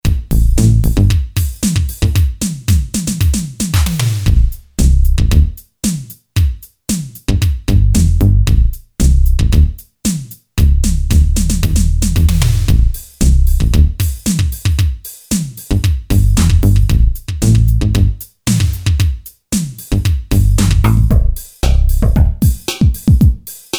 ELEC ROCK, Boss DR-3

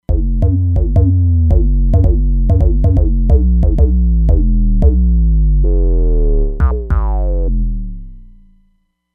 DnB Bass

